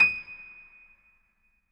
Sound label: Acoustic Piano